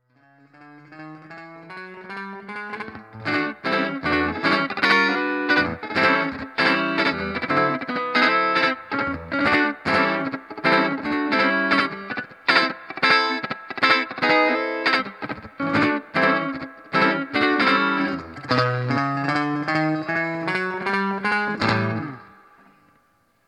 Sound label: delay